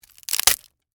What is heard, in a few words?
Crunch Short Crack, Wood, Basket; Blast Wave